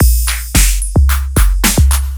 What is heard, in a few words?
Beat 110bpm; hiphop; Beats; musicradar